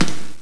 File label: Dig Space; MarkoSuominen; GraveYard